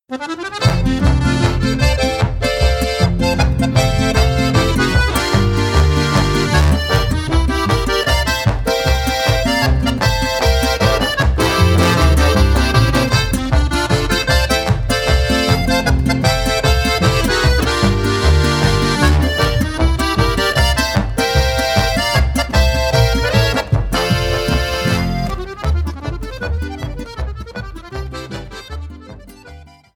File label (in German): swingende harmonika